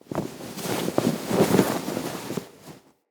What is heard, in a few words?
Tent Cloth Rustle; Soul Plane